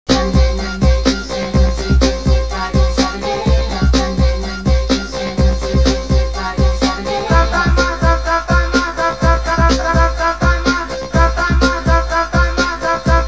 funk

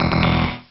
health; svencoop

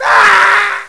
grunt die; svencoop; cncgrunt

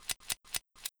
357 idle unsqueeze, weapons; svencoop

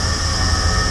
turb loop, tank

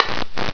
light on; tfc

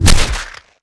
hu claw hit; svencoop

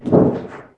duct, player; firearms

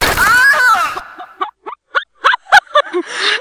pain door; svencoop

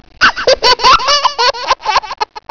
kids laugh

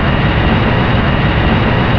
jet engine; svencoop; breakfree3

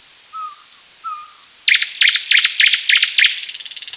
weird bird

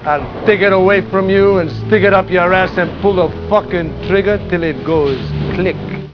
click

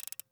357 idle fidget release, weapons; svencoop

